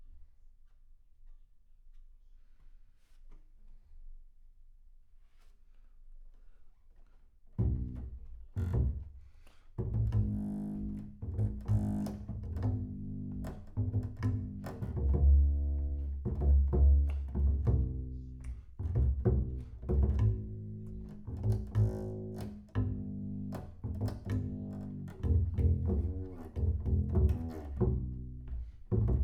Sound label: Bass Room